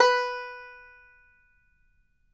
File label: Banjo B4 R; Sampleheads; Whole Lotta Country 1 & 2